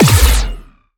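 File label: Sci weapon Shot Medium Blaster Punisher; Robobeat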